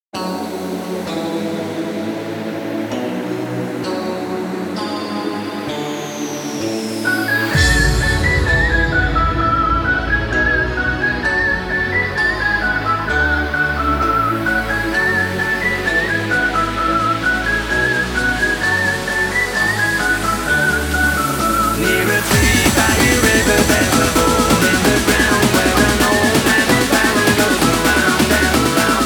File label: intro outro 130 bpm